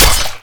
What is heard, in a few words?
attack; dota; bloodseeker